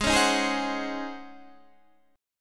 Db Major 7th Suspended 2nd Suspended 4th, Ab, guitar